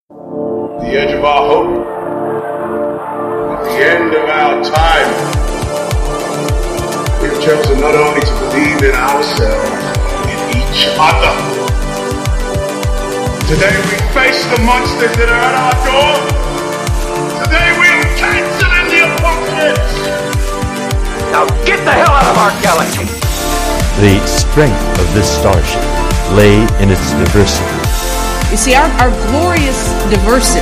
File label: Intro Music